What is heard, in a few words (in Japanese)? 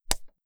ぱちん